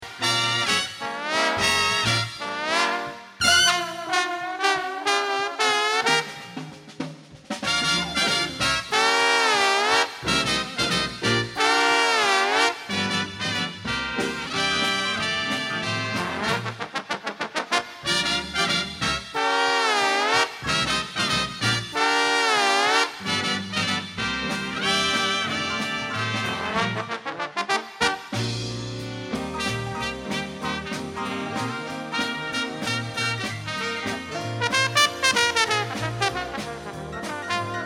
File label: Slide Trombone